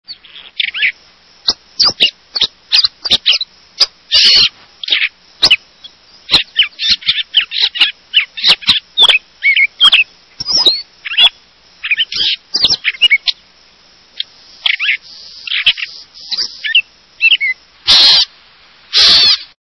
Gray Catbird

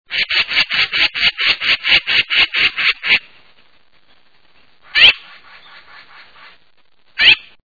Yellow billed Magpie

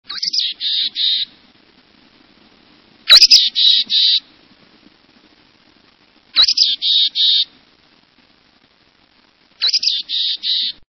Mountain Chickadee

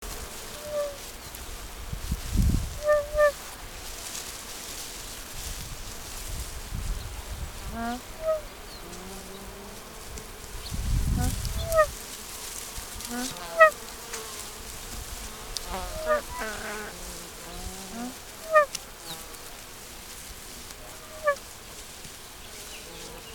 Nene, songs

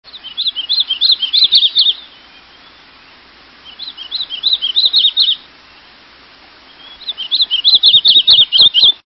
Painted Redstart

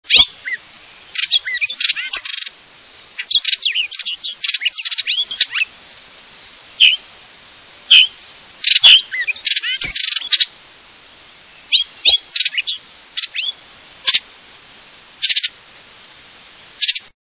Hooded Oriole, songs

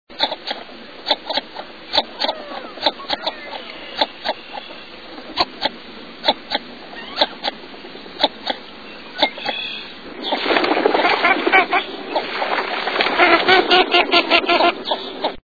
Northern Shoveler, songs

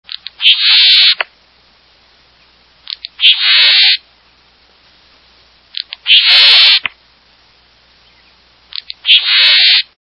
Le Contes Sparrow

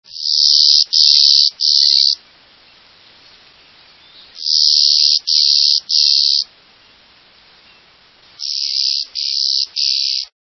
Clay colored Sparrow

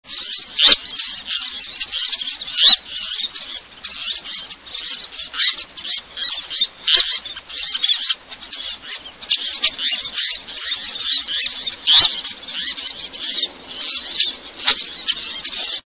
Least Tern

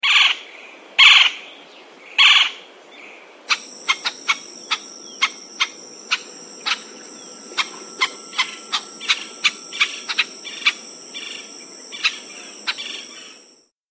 Golden fronted Woodpecker

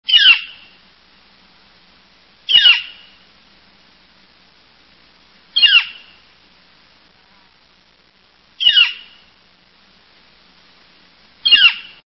Gilded Flicker

